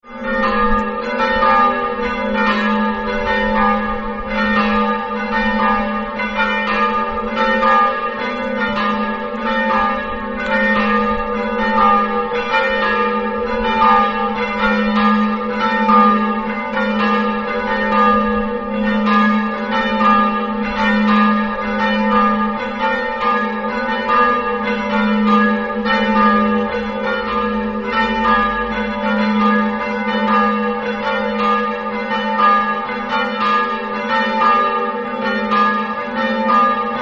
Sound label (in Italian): campane; slorenzo